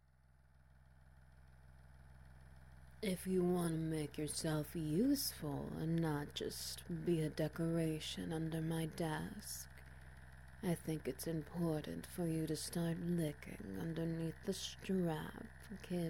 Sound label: Wet Noises